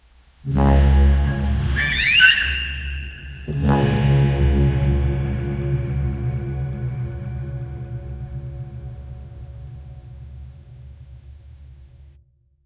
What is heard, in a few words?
stomach gurgles quiet